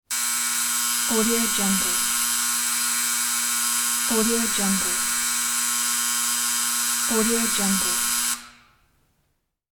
sound stage buzzer makes long buzz; cartoon

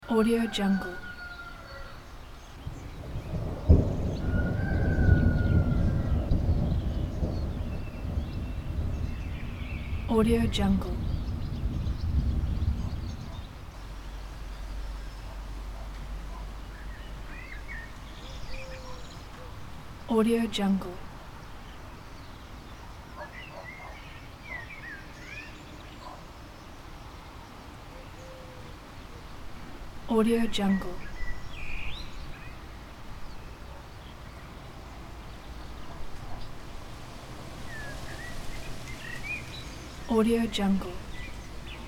light storm over spring countryside loop; nature sounds

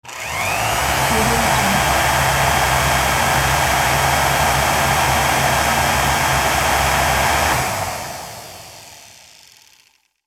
electric heat gun starts and shuts off; industrial sounds

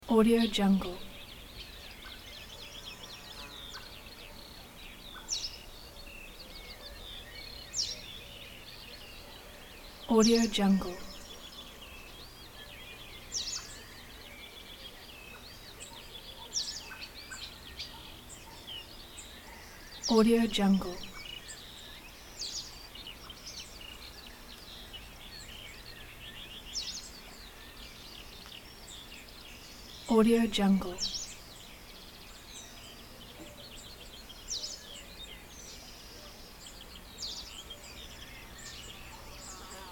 summer countryside morning birds loop; nature sounds

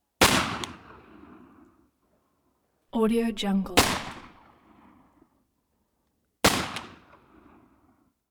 gunshots shotgun gunshots reverberant

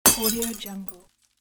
glass breaking; خرد شدن شیشه; transitions and movement

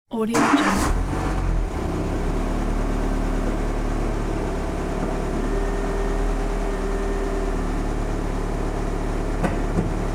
car driving from garage to snow

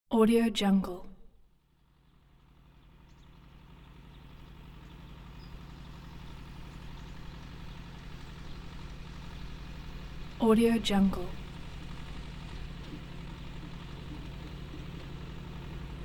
tractor arrival after ploughing mid distant; رسیدن تراکتور به زمین کشاورزی; industrial sounds

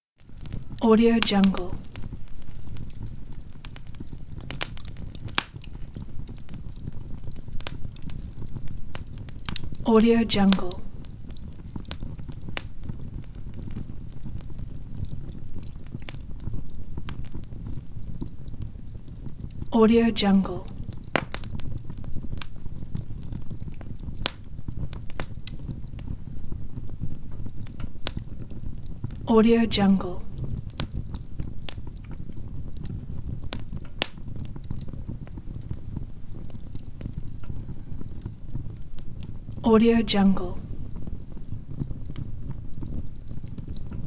coal fire; آتش زغال

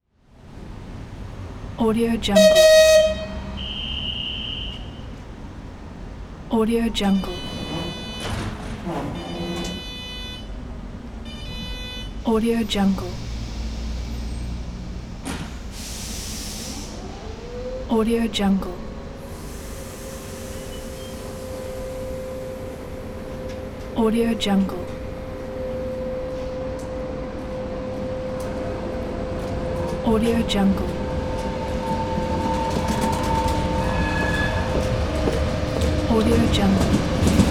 train leaving station general ambience

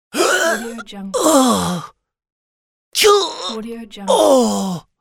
male grunt death shout; human sounds; مرد در حال مرگ

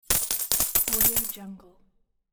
coins; انداختن چند سکه روی هم

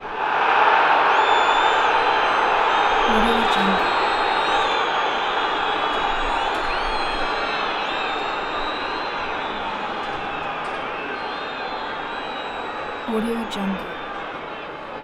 crowds football stadium victory success whistles; تشویق تماشاگران فوتبال; human sounds

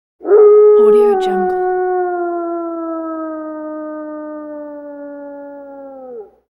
wolf howl echo; پژواک زوزه ی گرگ

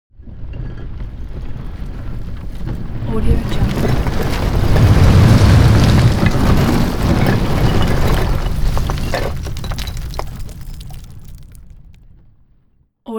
nature disaster earthquake; فاجعه ی زلزله

